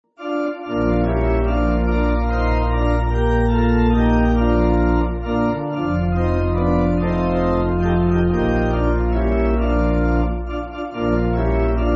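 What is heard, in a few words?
Pipe Organ, church music; The Remainder Of My Life; McGrahan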